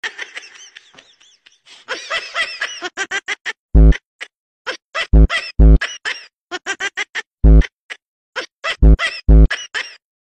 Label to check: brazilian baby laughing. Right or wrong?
Right